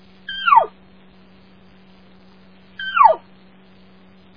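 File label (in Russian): писк оленя вапити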